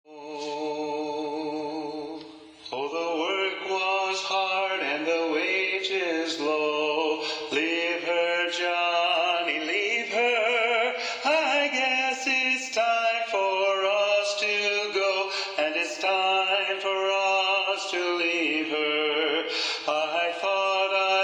Leave Her Johnny, Leave Her (Lead Predominant, sea shanties